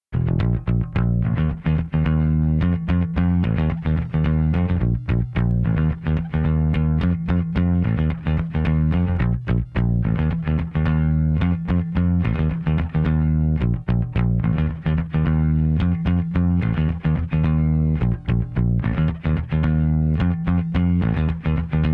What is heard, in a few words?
rhythm